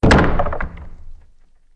hit wood

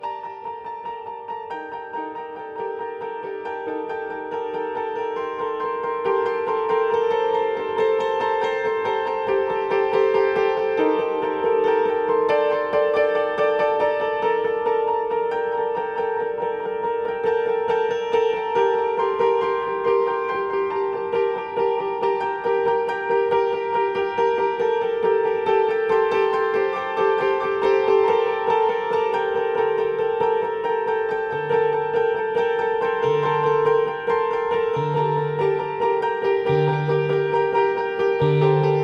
Music Tension; Battlefield 4; Karaktaer Naval B Survivors

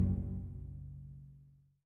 Loop Tail; Good Job

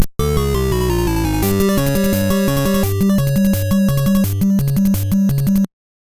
Game B Lost, gb; Radar Mission

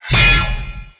Shield; Idle Champions of the Forgotten Realms

